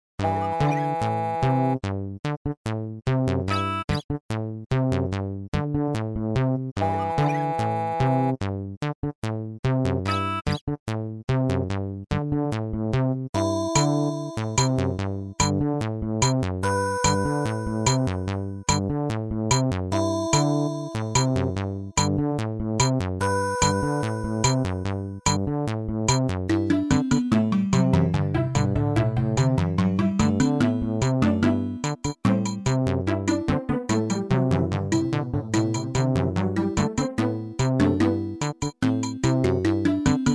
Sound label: jazz bass